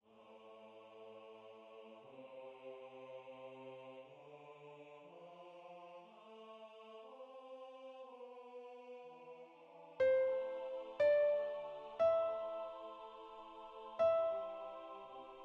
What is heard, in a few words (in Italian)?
piano Soprano